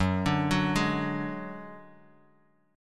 Gb Suspended 2nd Sharp 5th Bass Standard; Gbsus2#5 arpeggiated; 14,17,16,13

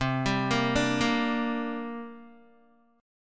C 7th Suspended 2nd C down Guitar; C7sus2 arpeggiated

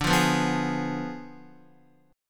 D Diminished 7th D down Guitar; strummed

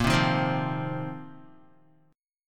A sharp Suspended 2nd Suspended 4th, Guitar; 5,3,3,5,5,x; 4F,4A,5C,5G,5C,6E; strummed

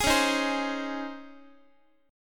C sharp Major 7th Suspended 2nd, Guitar; C#M7sus2 strummed; x,x,11,11,7,11; JGuitar

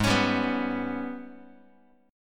G sharp Minor Major 7th Double Flat 5th, Guitar; strummed; JGuitar

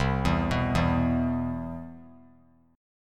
C Major 7th, chord; CM7 arpeggiated; JGuitar

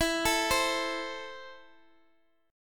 E Suspended 4th Sharp 5th; x,16,17,15

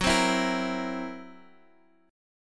Fsharp 7th Flat 5th down Guitar Half Step Down

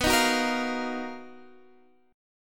B Major Sharp 11th, B, down, Guitar Standard; BM#11; strummed; capo=9

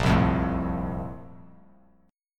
Bb Major 7th Suspended 2nd, Bass Standard; BbM7sus2 strummed; JGuitar

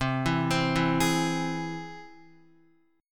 C Augmented, Guitar, chord; arpeggiated; x,3,4,5,4,4; 4F,4A,5C,5G,5C,6E; JGuitar

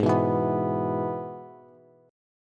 Ab Minor Sharp 5th, Bass; Abm#5 strummed; JGuitar

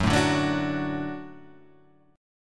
F sharp Minor Sharp 5th F sharp, Chord

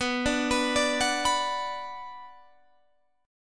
B Minor B Guitar Open G, chord

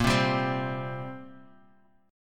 G Minor Double Flat 5th Bb chord

